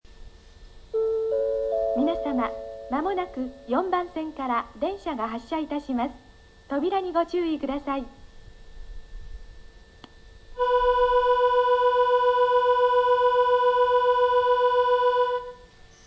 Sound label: bell, station; 4番線発車放送＋出発時機合図; nankai; ozaki